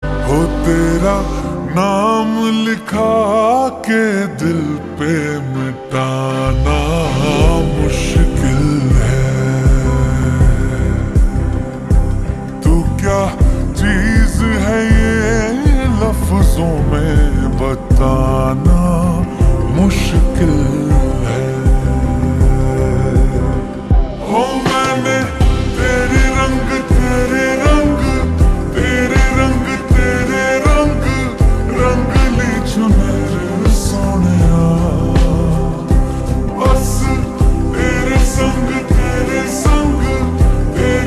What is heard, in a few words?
Ringtone, slowed and reverb